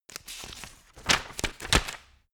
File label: turning page